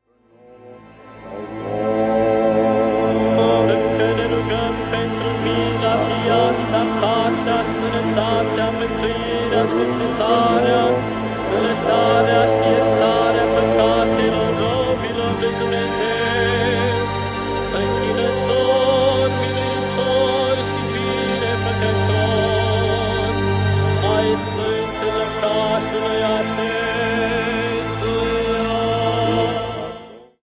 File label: reverse; backmasking contrario